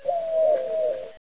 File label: western owl